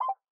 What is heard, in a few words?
notification